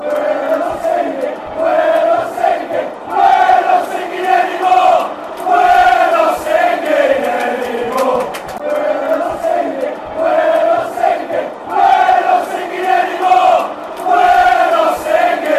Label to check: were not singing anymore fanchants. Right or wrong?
Right